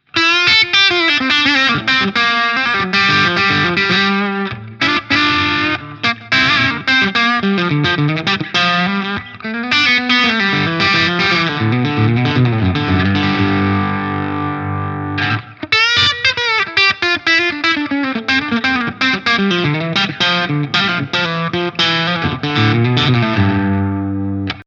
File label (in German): Steg crunch